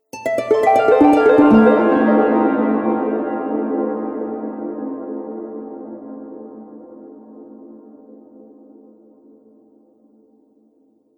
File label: Harp Cascade Delay; Musical